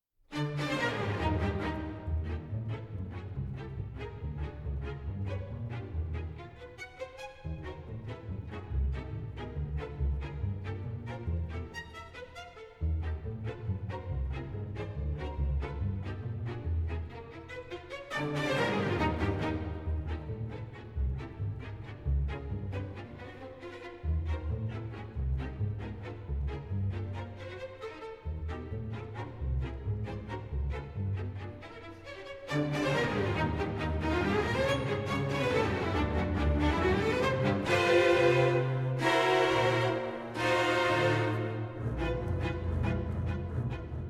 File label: Polka